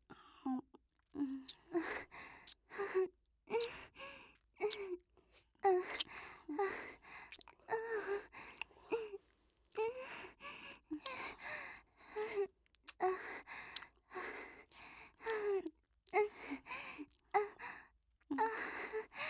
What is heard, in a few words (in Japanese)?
乳首舐め ループ; 僕とお姉さんの音声; ループ音声集